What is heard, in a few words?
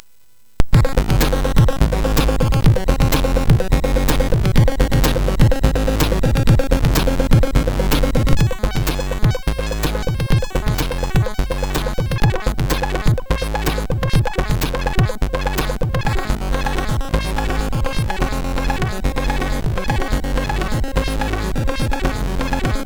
sid, MOS6581R4; Minimal; hvsc